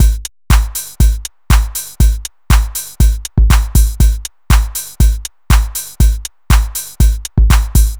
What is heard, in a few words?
120 trance beat, Drum & Perc Loops